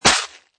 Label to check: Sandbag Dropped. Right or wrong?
Right